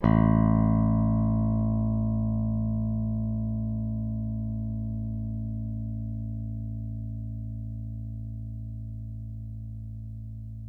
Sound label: A0 pick, bass